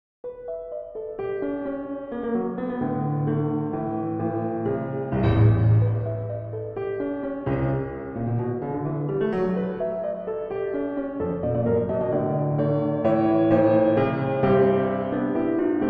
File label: Quarter-tone Piano; Quarter-tone Piano Prelude No. 7